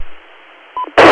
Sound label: squelch